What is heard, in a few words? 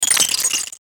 Hit Data Distortion Readout; Sound Design; Blastwave